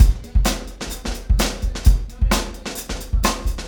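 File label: beat, Room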